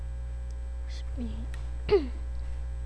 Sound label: spin